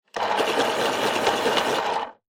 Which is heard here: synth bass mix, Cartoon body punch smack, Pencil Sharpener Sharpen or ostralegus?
Pencil Sharpener Sharpen